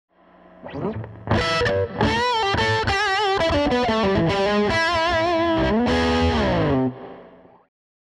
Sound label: hum blade gain